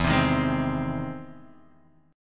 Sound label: E Augmented Major 7th E down Guitar Half Step Down